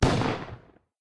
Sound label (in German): waffen schuss; minecraft